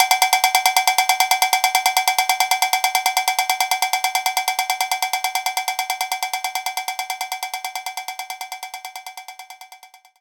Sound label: Cowbell